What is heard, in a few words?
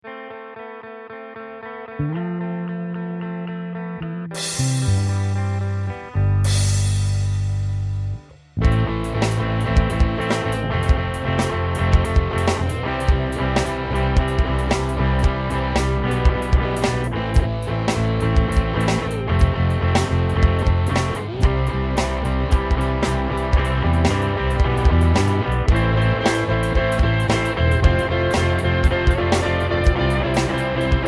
4 4 rock beat